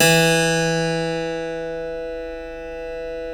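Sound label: E2 clavinet right, Keyboards Acoustic; USB Soundscan vol.17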